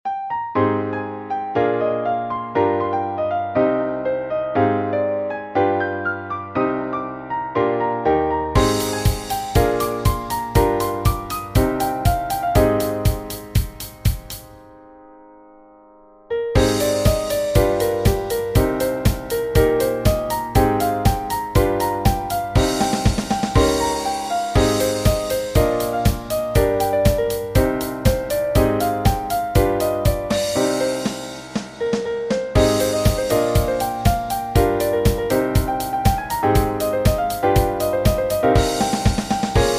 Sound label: 8beat; 参考演奏